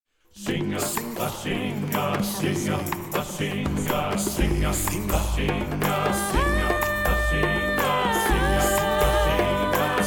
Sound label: SATB, Solo, Percussion, Sprecher; Lobet den Herren allezeit; Singa Yesu Singa